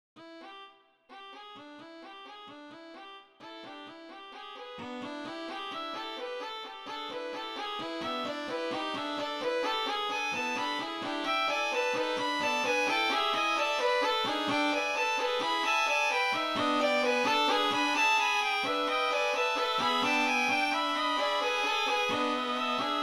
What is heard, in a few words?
Canonic Quartet